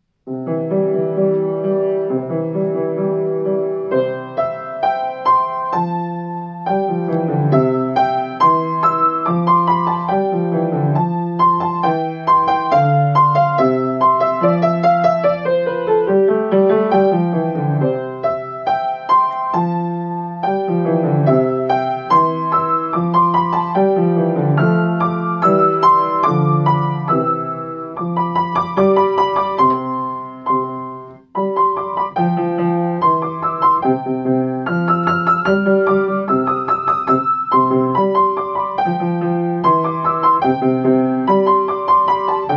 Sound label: Crystal Bells, piano solo; secular